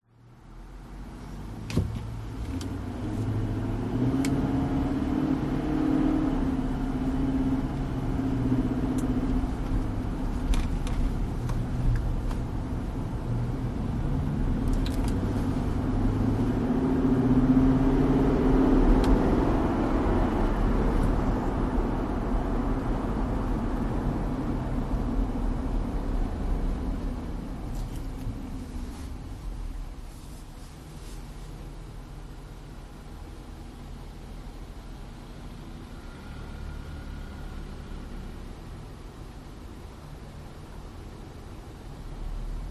vehicles car nissan patrol pull away drive stop idle lights away indicate